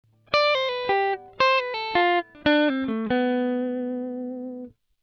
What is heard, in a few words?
pull